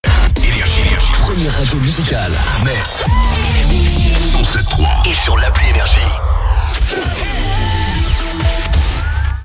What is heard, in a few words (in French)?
JINGLE, Première Radio; METZ, 107.3 FM; NRJ